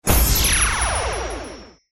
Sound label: btn refresh; lucky charm